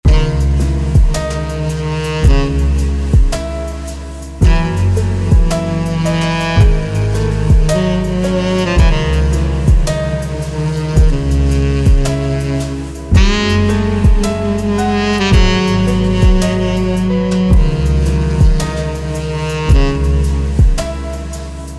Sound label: Entrance Jazz